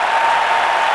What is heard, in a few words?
Amb Crowd; Cars; XBOX